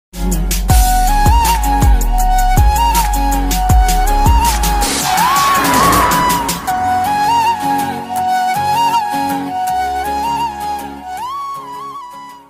instrumental ringtone sad ringtone love ringtone mobile ringtone attitude ringtone